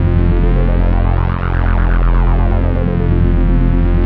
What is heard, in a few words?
phat modular sci fi, Synth